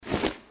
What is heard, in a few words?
equip; shouLieGaoShou